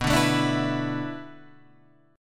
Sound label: B Minor 11th B; Bm11 Chord {7 5 7 7 5 7} strummed